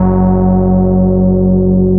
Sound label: F-2 Synth; Vana